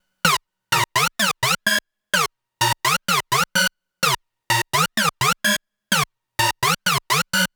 Portamental, Underground UK House & Electro, Synths; Sonic Foundry (Sony Creative Software)